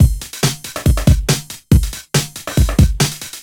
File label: Loops BB 140, BreakBeats; Vengeance Samples